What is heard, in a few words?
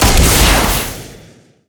rocket launch